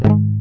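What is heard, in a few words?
GUI G#, acoustic guitar; Best Service Gigapack 2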